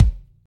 kick F key, Royality free kick tuned to the F note. Loudest frequency: 252Hz; drum single hits one shots; kick drum samples